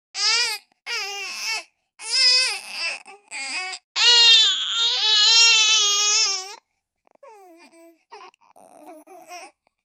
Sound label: baby crying sound